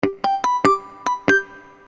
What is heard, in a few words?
TG100 Harmonics